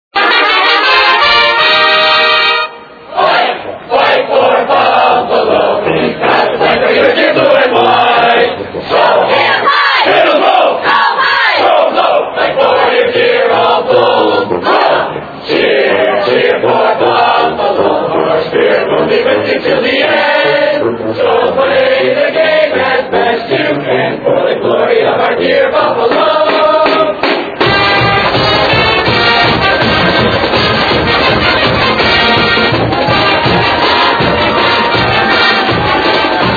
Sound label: Fight Song - Victory March long; Buffalo Bulls; NCAAF Football